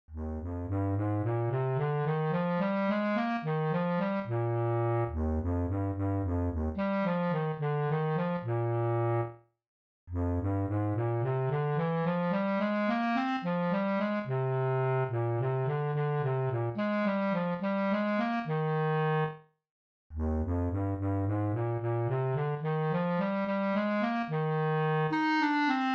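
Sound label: Bass Clarinet Contra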